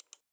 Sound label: Mouse Click